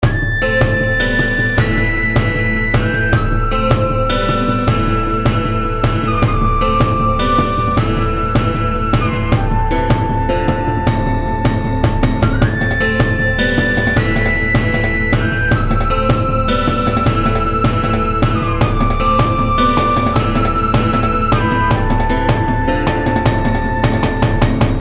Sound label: taiko; lego